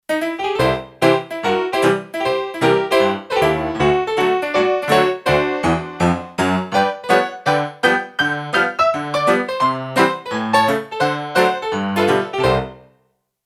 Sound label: Honky-Tonk, Kurzweil K2500X; Factory Programs